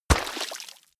horse step water

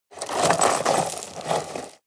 scrape rocks hard

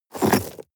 shovel dig short

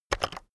keyboard external enter press fast heavy

